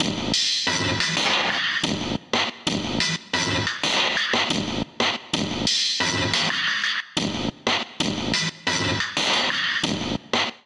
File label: Distorted Future Kit groove 90 bpm; MIDI Clips; 89 - 120 bpm; Ableton; Glitch and Wash